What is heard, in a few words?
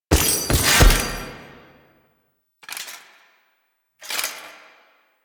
First Death Foley; Enemies; Finishers; Dax; Warframe; Duviri